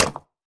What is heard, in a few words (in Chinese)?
泥砖破坏声